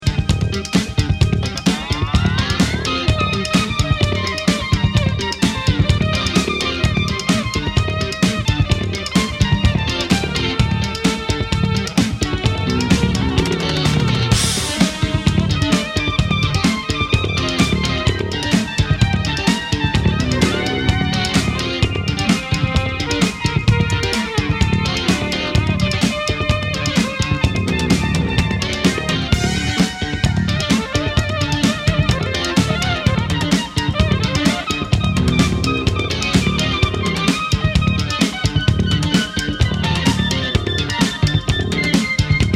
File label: Roland GR